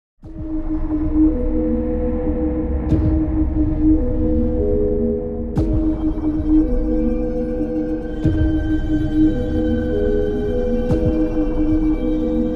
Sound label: Horror tonal background menu ambience